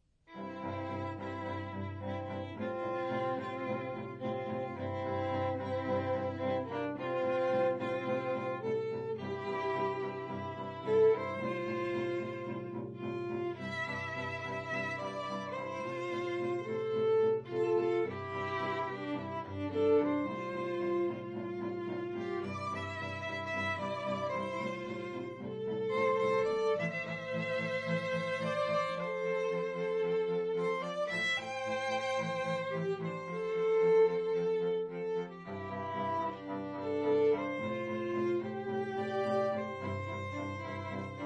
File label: string trio